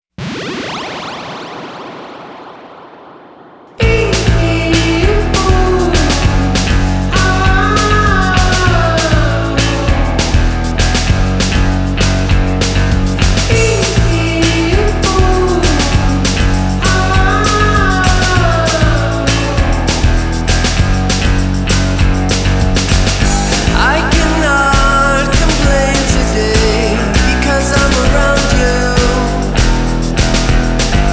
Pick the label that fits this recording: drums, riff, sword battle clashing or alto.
drums